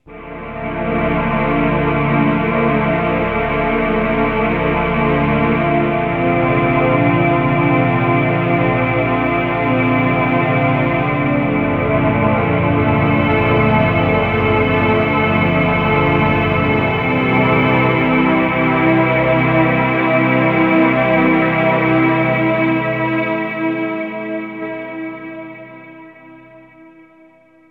BAC, MUSICMOTIVES; Best Service ProSamples vol.49 - FX-Area; AKAI

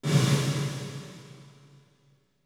HALL; Back In Time Records; Big Bang; THE ROOM

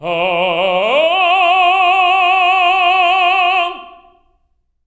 TENOR OCT F#3

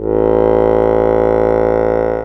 DIATON BA, AKKORDEON, Alpine Volksmusik; Best Service

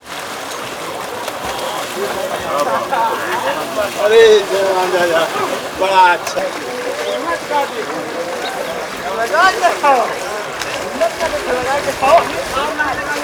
BENARES, AMBIENCE; USB Soundscan vol.45; AKAI

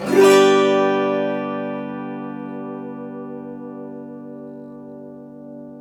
AUTO HARP

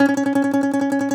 DOMRA, Plucked Strings, Segundo Domra

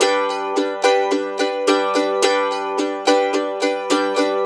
Blues Guitar Samples, RIFF; ILIO - Fretworks

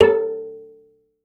kalimba; Voice Crystal - Steve Reid Definitive Percussion; ERIE FILM-Q

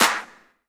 clap, hip; Best Service; Dance Mega Drums

